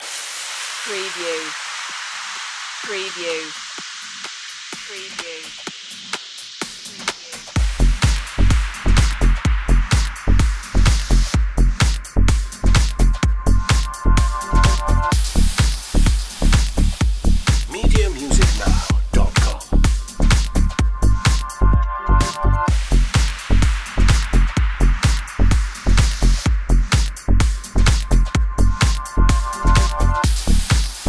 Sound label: underwater beatz